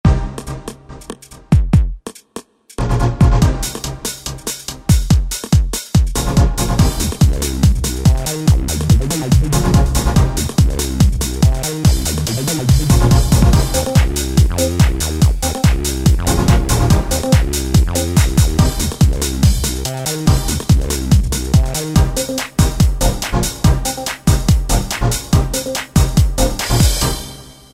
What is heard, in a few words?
Pop Techno; Yamaha DJX; Styles